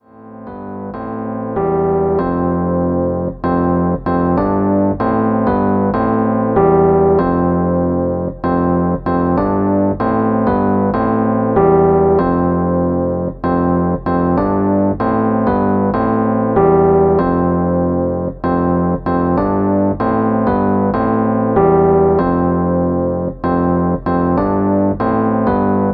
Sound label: Rhodes chord, hip hop chords; rhodes chord 07